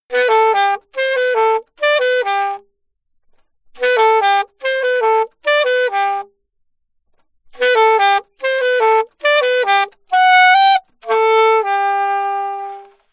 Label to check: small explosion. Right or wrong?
Wrong